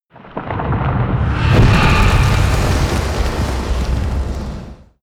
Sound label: HOLY STONE KEEPER WAR POWER UP